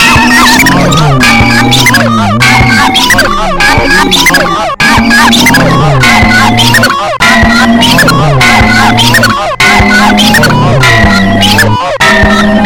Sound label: black midi